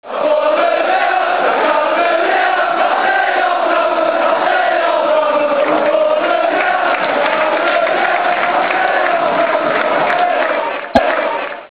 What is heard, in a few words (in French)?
Marseille en flamme